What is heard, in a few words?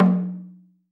AFRO.TAMB, AFRO.TAMBORA; AKAI S-Series CD-ROM Sound Library VOL-5